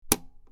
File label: Button Large Button P, switch clicks